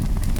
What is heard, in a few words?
flame thrower